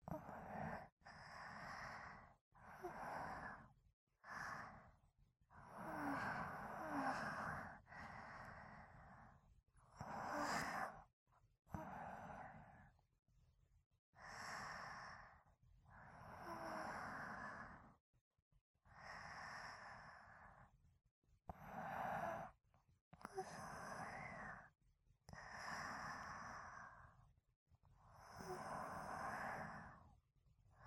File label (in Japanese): 寝息 ループ用